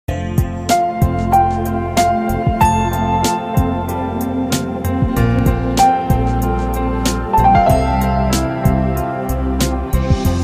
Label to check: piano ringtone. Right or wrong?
Right